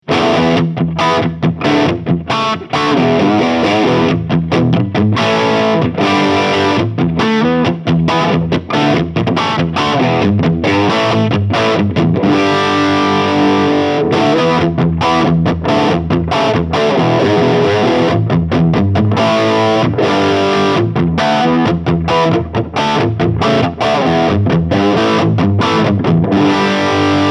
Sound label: Orange TH100 Crunch; Shape 9 Uhr